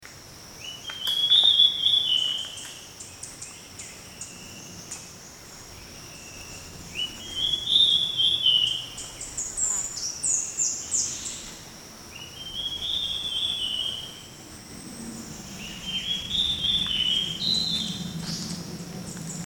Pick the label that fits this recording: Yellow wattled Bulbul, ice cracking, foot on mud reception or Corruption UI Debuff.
Yellow wattled Bulbul